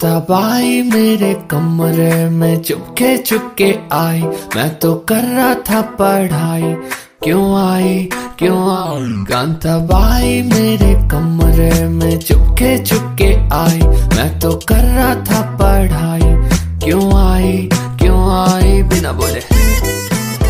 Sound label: romantic ringtone english